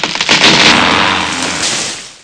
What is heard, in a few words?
Tree; panzer